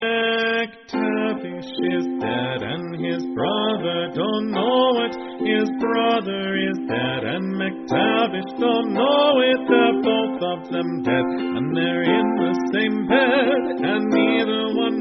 Ukulele

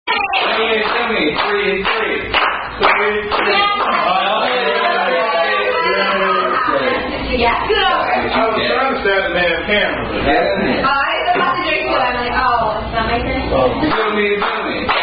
Drinking Game (Standing And Clapping Variant); Zoomy Zoomy

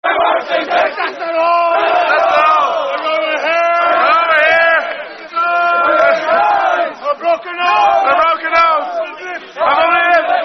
Coaches Rugby Chant; Alluette

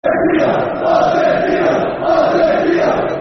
Football Chant (Soccer Songs)

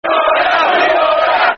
Who Are Ya, Football Chant; West Ham WHFC

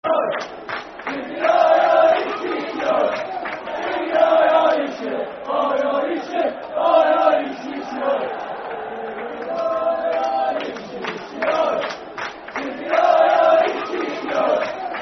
Football Chant (Soccer Song); Aye Aye; Walsall Wfc

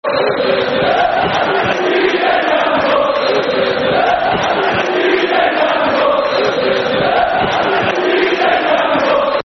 Football Chants (Soccer Song); Dj Campbell; Birmingham City Bcfc

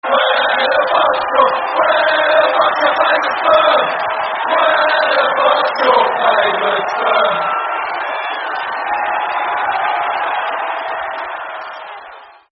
Famous Firm No Cheer; Blackburn; terrace chants

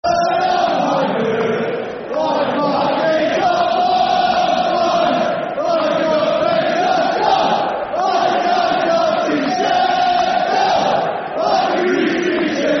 Football Chant, Soccer Song; My Sheffield United; Sheffield Utd Sufc

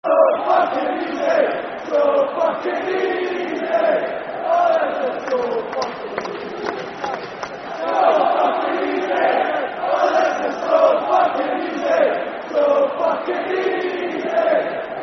Football Chant (Soccer Songs); So Easy; Falkirk Ffc; fanchants

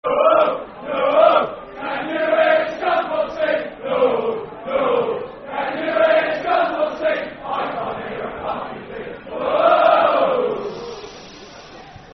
Football Chant (Soccer Song); Can You Hear Scunthorpe Sing; Milton Keynes Dons Mkdfc

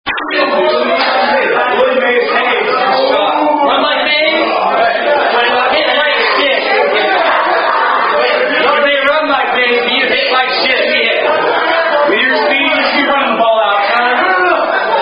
Rugby Drinkup, Unknown Drinking Game

